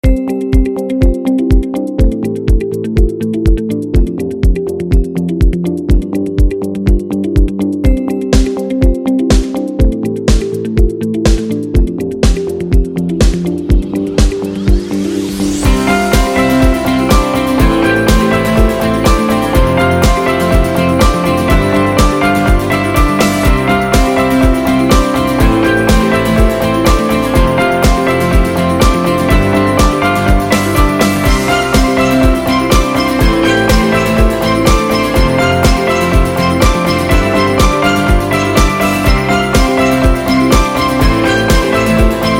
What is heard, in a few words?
Corporate Minimal Rock; corporate music vol 4